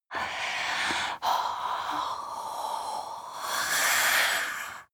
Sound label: breath female eerie